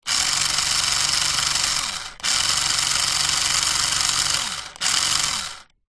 leisure radio controlled car motor wheels free wheel close up; zapsplat